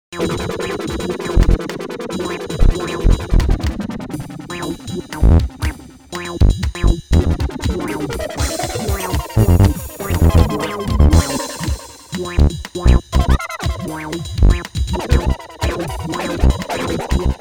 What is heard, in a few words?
MIDI ditty